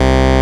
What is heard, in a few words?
SYN TB, Roland TB303; Sound & Vision - Gigapack I CD 2